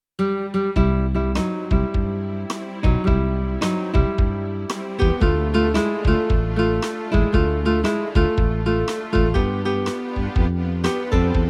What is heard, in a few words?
Volendam Sound